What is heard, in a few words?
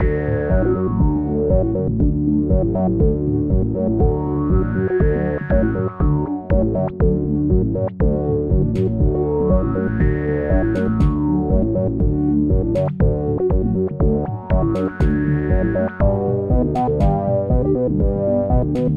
loop session